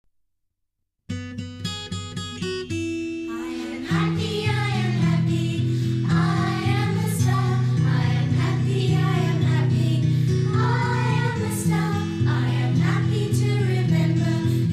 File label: english songs for kids